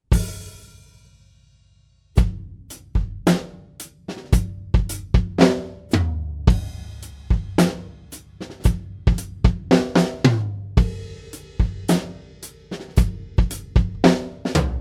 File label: reverb